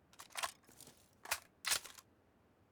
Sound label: M16 extended quickdraw